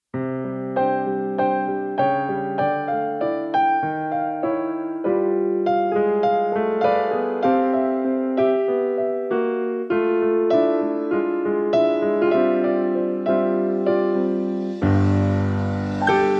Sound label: vocal snipet